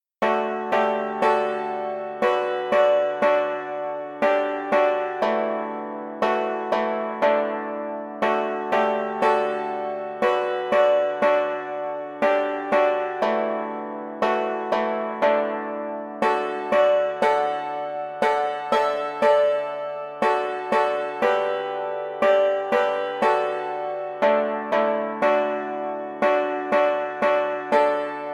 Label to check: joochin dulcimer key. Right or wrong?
Right